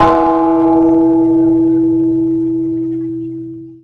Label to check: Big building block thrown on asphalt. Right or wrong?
Wrong